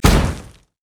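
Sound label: armadillo basic attack; rammus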